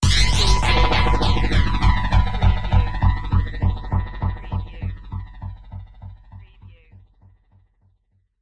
fluttering sci-fi revelation

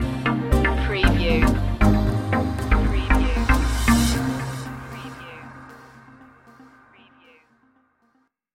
sunshine waves stinger

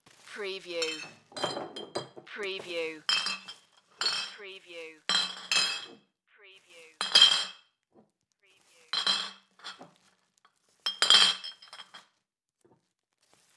Bottles Beer Gather; Foley; sound-effects-bible

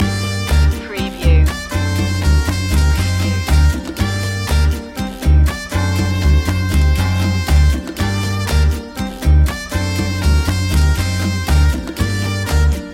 cuban heat

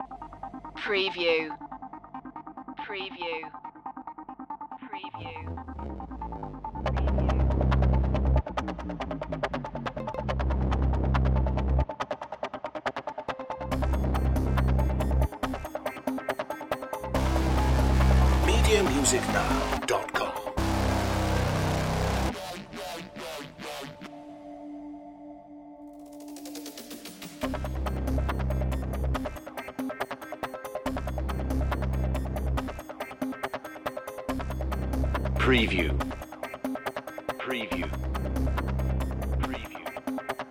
apocalypse hybrid only

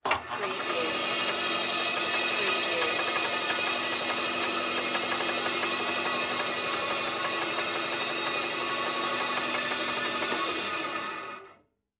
Band Saw On Run Off; sound effects bible

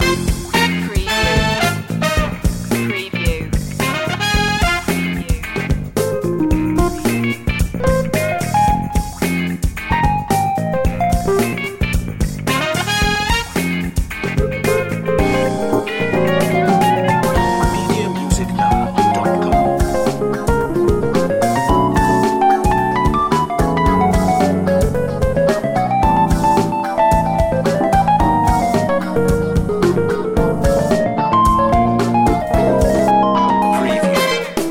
groove loop

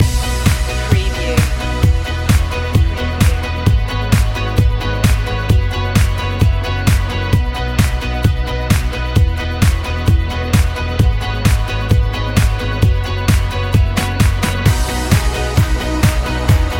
neon guitars

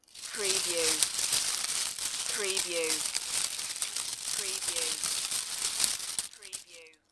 Leaves Rustling

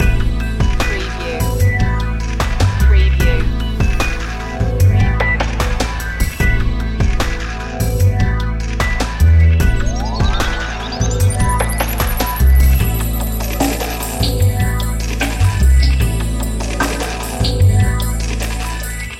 ambient skies loop

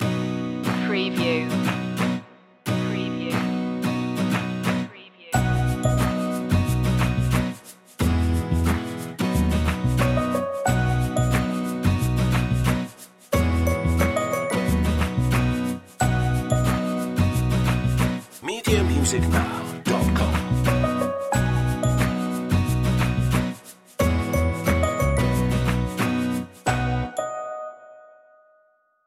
happy clappin

